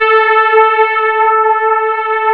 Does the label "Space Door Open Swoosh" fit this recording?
No